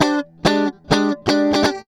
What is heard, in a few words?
GUITAR LICK; Ueberschall; Jam Box